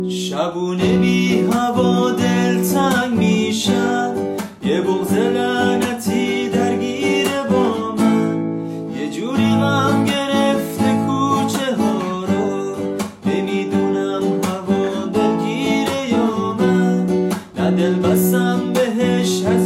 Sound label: Guitar